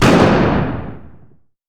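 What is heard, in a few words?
revolver shot exterior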